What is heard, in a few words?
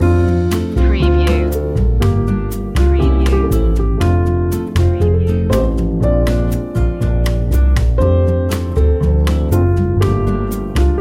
auld lang syne jazzy long loop